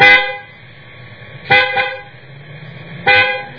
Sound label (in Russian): zvonkij oglushayushij signal avtomobilnogo gudka